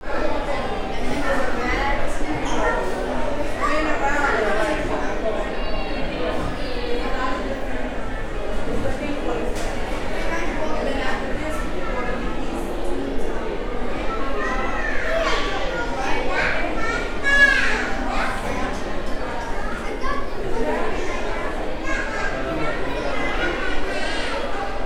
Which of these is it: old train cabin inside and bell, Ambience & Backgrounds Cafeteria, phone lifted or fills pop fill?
Ambience & Backgrounds Cafeteria